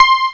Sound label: piano el mod; Amiga Special - Software Hits; orchestra; keystring